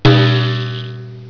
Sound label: broumm